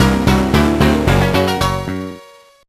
Unlock Secret; Drake & Josh; GBA

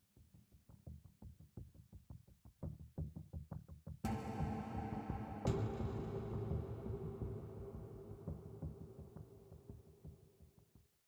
Military High 85bpm

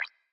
Chapter Completed Number Up; pulling no punches

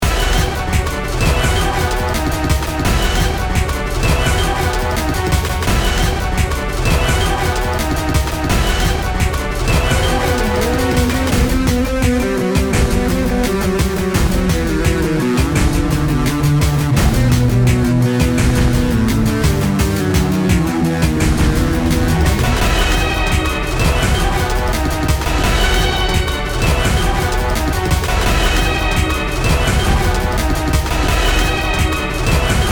Music Loop; battle bears 1

